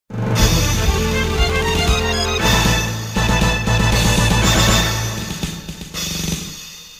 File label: mision complete; metal slug